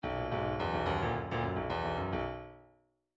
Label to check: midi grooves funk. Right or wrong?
Right